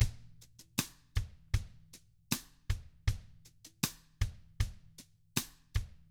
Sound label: COUNTRY, Drums